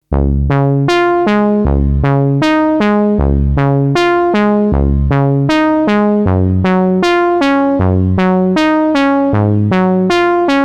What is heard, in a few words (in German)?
Formant Wellenformen